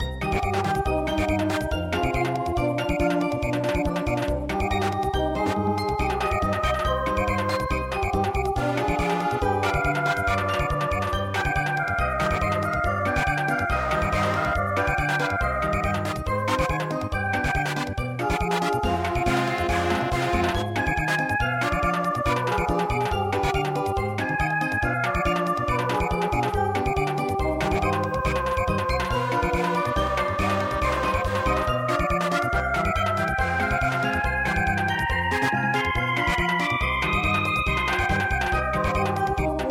Samba; Symphonie No5 Schicksal

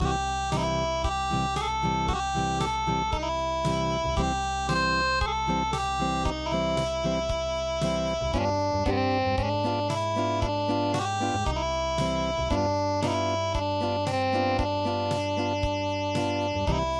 Unplugged, midi

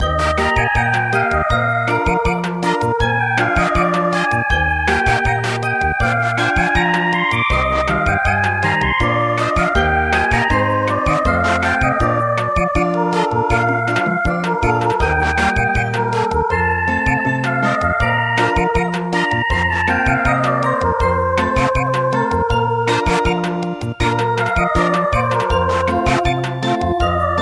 Samba

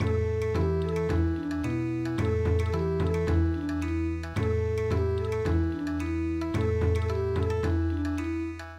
Bon Odori, midi

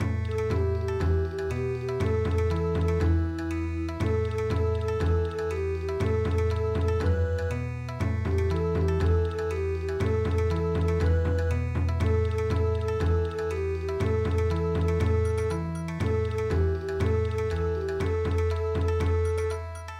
Bon Odori; Mikan Kinkan

